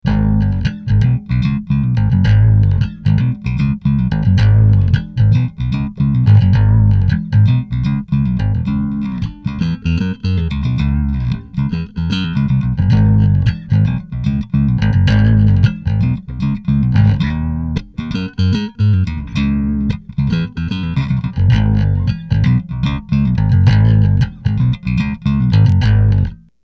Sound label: riff